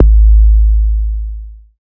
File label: BD ANALOG; Sound & Vision - Gigapack II CD 2